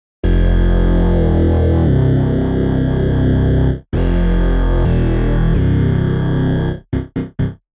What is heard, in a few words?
Bass